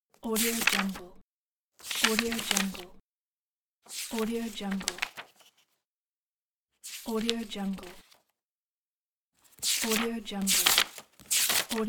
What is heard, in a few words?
turning book page; dragospitaru